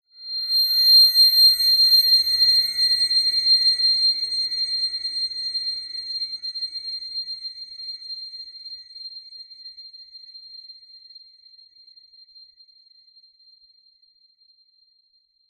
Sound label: horror whine shrill high pitched; zapsplat